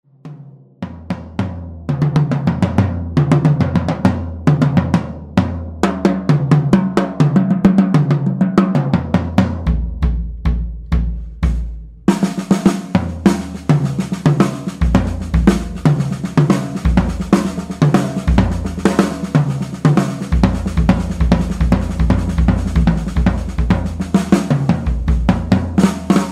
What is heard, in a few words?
big beat shells